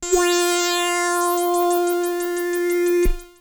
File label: F F4, synth; Roland S100; musicradar-synth-samples; Roland F Multi